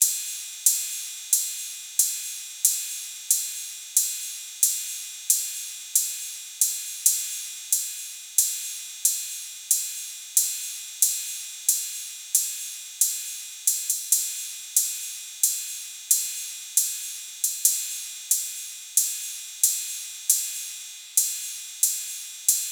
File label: 68 Drums Ride; Glimmer